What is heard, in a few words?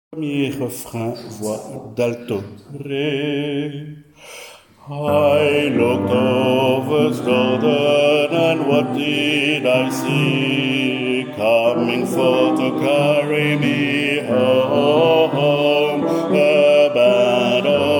swing low swing chariot alto 1er couplet